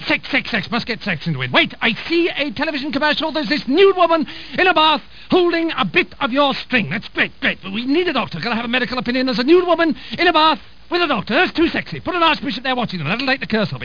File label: string